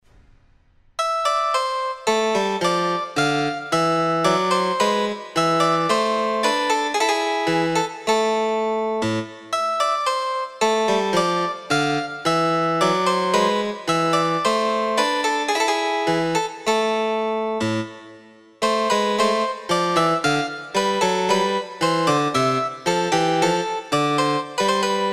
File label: Bourree Harpsichord; J Krieger